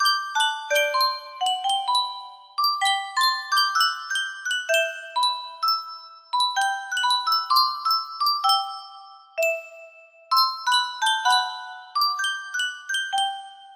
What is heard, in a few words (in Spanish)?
Yunsheng Caja de Musica, music box melody